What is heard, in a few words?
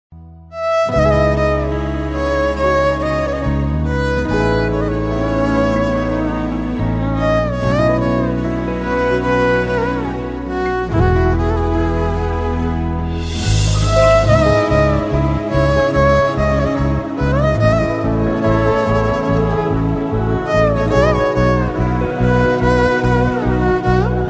violin ringtone